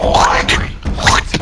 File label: spit; ugandan knuckles